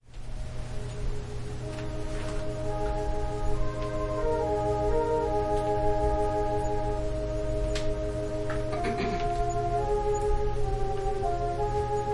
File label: Organ prelude